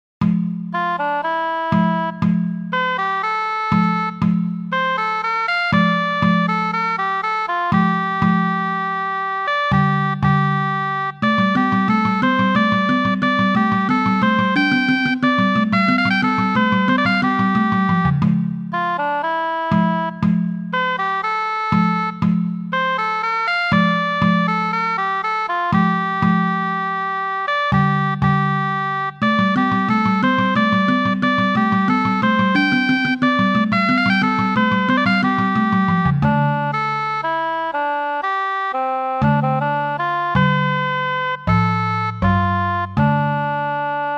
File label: blues scale; retuned midi